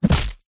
impacts body hit baseball bat hard whack crack crunch